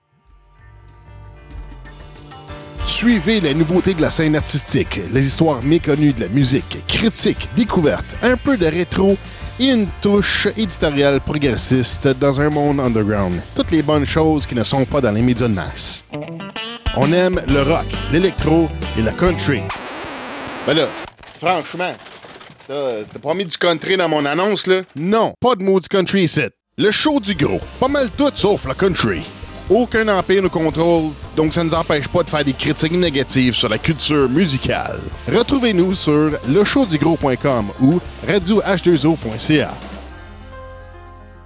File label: Jingle Promo Country